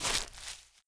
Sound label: footstep